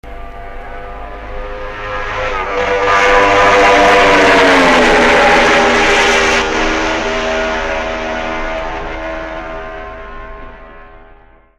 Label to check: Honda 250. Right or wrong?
Right